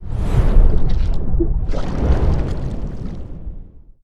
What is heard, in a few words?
Underwater Creature Swimming Gliding Bubbly